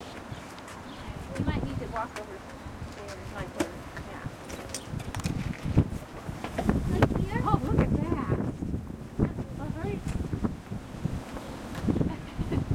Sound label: warre shake, bees